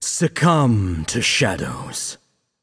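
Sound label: nonverbal yay; voicepack; smite; merlin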